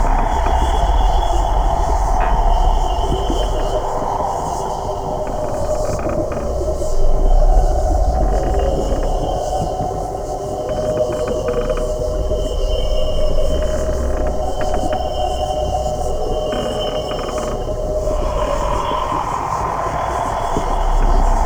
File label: Vaults Vault Loop; Gameplay; Warframe; Entrati